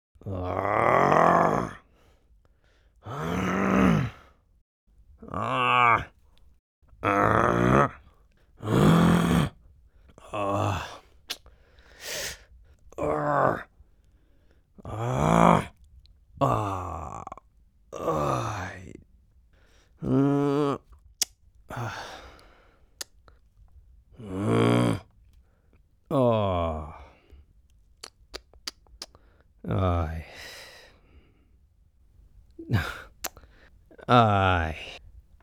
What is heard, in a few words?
Angry Frustrated And Disappointed Male Various Sounds